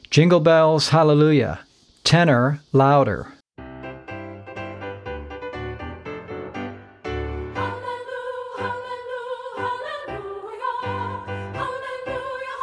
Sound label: JINGLE BELLS HALLELUJAH TENOR LOUDER; practice tracks for singers